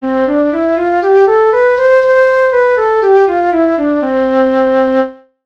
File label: C major Scale; テンポはそのままにピッチ調整; Pitch Switch